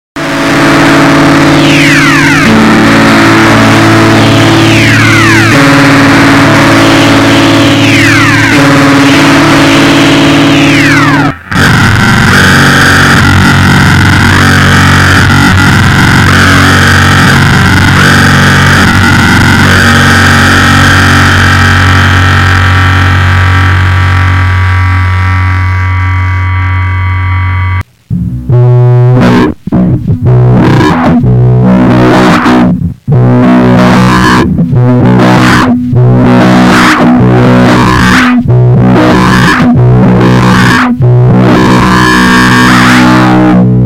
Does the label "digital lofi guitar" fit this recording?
Yes